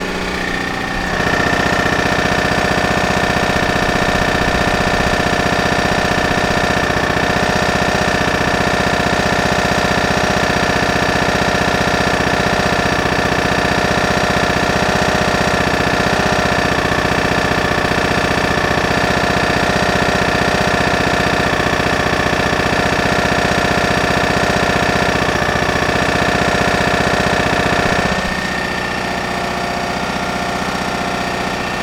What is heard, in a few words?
kohler 21hp single